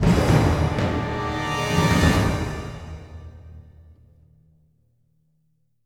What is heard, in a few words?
Bell Tower, bell end